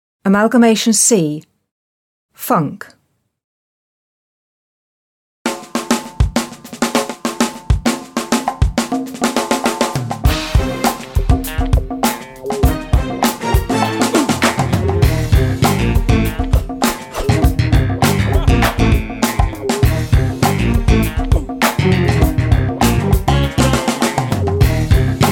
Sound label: Funk